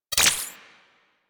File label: Blastor Pistol Low Clip Mech; Warframe; Weapons; Corpus